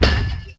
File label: Lay fence